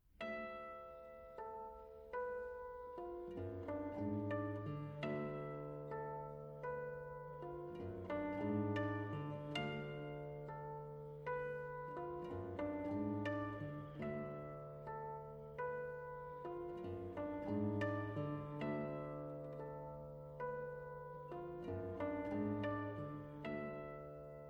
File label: guitar